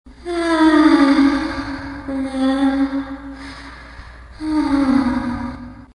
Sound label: Ghost